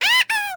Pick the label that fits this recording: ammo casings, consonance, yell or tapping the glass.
yell